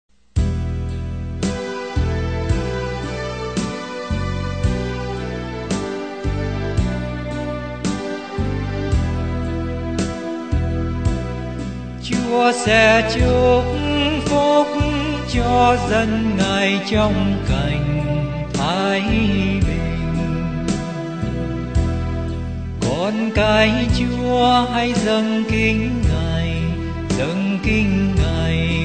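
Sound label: soprano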